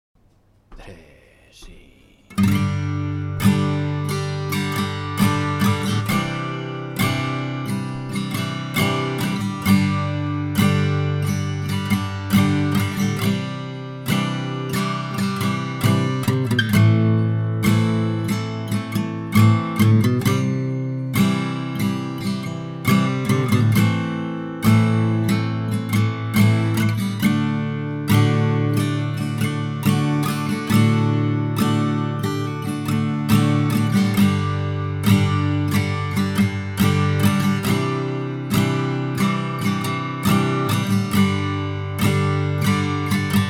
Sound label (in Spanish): Acompañamiento G